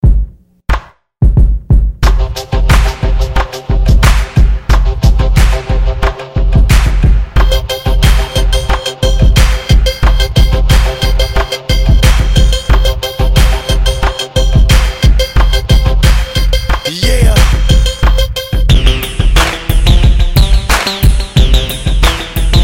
Hip Hop Beat

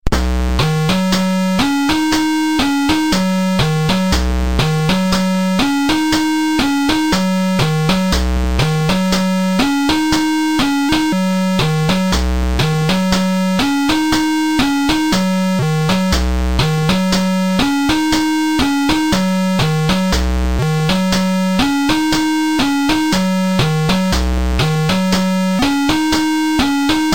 C64 bird

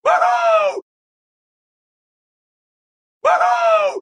wooohooo; Homer

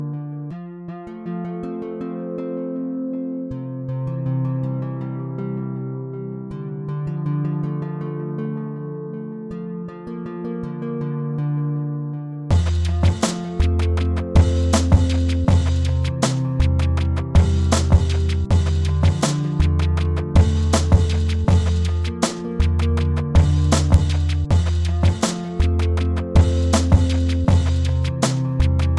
slow beat

